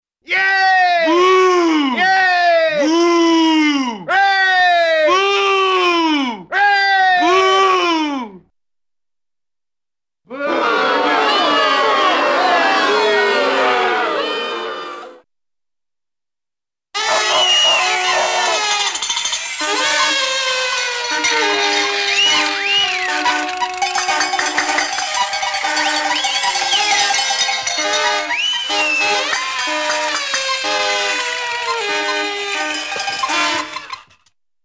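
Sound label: Yelling - Mixed Crowd Boos - Party Noise with Various Party, Cartoon; Hollywood Edge; Cartoon Trax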